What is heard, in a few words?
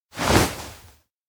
laugh big; makoto; anemoi パッケージ版